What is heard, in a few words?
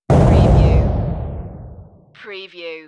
Impact